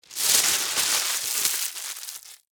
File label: Household Wax Paper Crumple Sheet; Cleaning